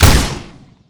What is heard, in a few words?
punish rocket; garrysmod